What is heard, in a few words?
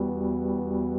arcane loop; MagicArsenal